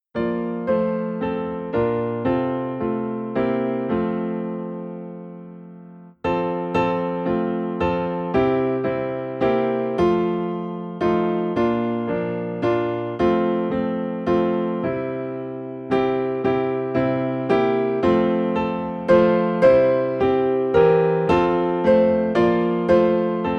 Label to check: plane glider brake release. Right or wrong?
Wrong